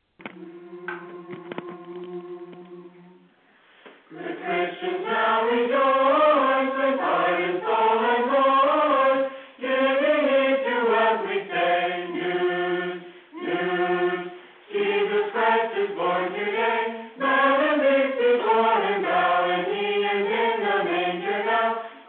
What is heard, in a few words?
chorus